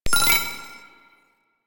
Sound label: Flying Robot Beep; Warframe; Quests; Veilbreaker; Ambience; Kahl Quest